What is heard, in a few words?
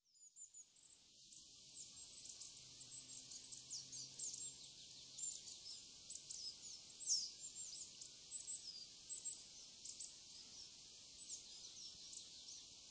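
Coal Tit; higara; sapporo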